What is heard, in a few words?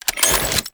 Reload, Pistol; Grineer; Amphis; Weapons